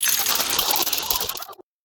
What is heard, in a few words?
Scarab Keychain Death